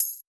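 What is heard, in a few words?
D&B Tambourine, Drum & Bass Kit; essential drumkit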